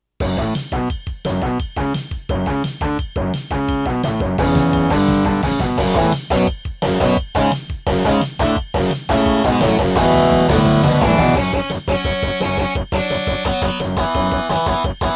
Intro, Gravis UltraSound 1MB Rev.3.4; Duke Nukem 3D

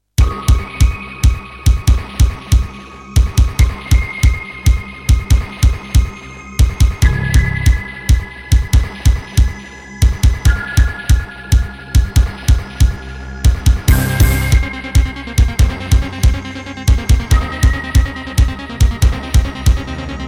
Serdaco Yucatan FX (Turtle Beach Cancun FX Clone); sigil doom episode 5

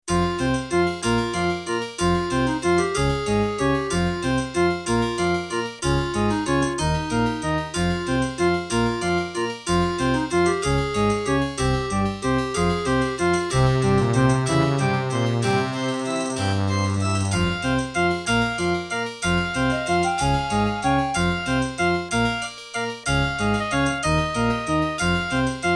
Creative Midi Blaster MB10; Death Gate

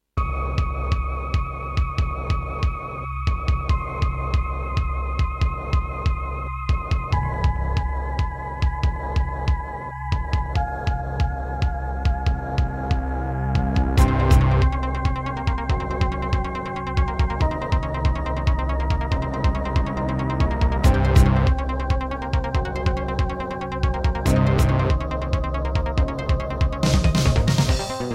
Gravis UltraSound; Gravis UltraSound 1MB Rev.3.4; sigil doom episode 5

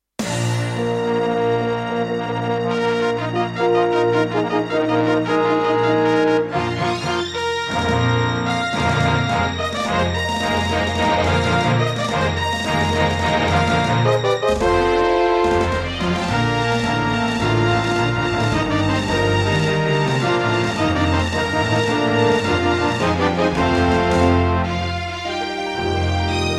Intro, Korg Audio Gallery AG-10; Dark Forces

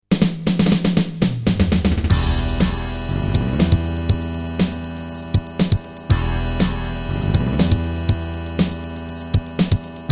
Terratec MiniWaveSystem WT64; Dune II

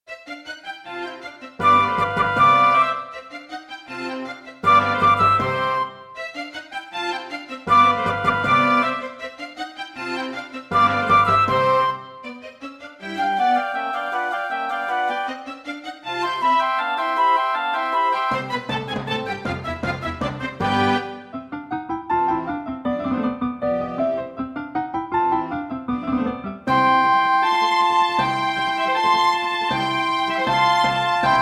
Roland Edirol SD-20 GM Contemporary; classical; Mozart; Concerto K467 partial

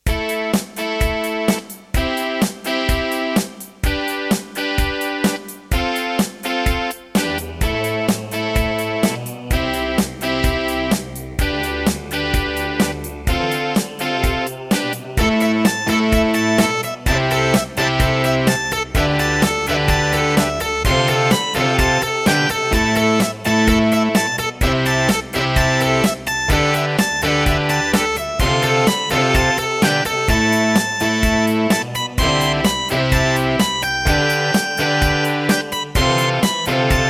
Wave 1, Yamaha MU2000EX GS MODE; Raptor

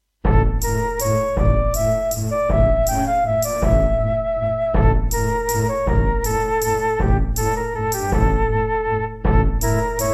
GeneralUser GS Bank; Serdaco MP32L

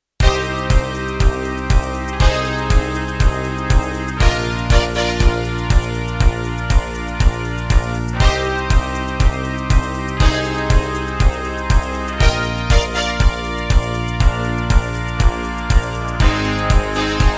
MidiPlus MiniEngine USB; Cybernoid II

